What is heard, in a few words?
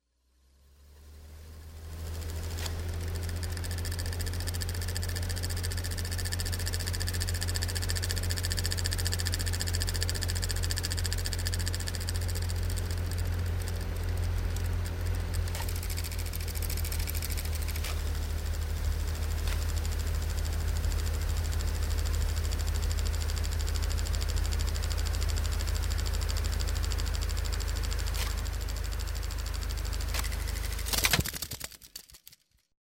Tape Recorder Rewind Reel To Reel Tape To Beginning